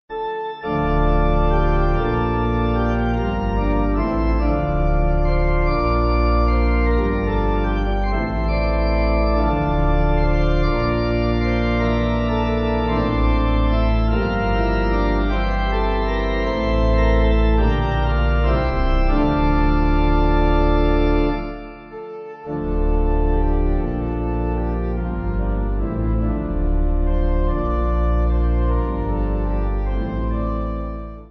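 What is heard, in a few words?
Pipe, Pipe Organ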